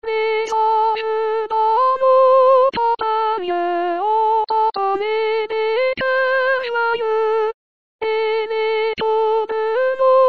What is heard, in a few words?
Soprano staff; Les Anges Dans Nos Campagnes